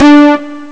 synth brass; Amiga Soundtracker Sample Packs

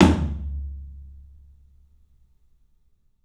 PA TOM, 14 TOM; 3D Sound; 3D Drums; CLUB 14 TOM

